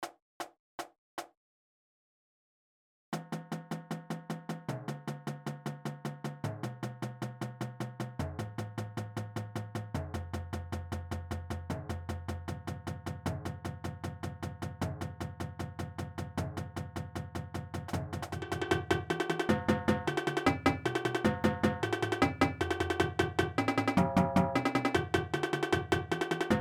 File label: Etude Warmup, drumline warmups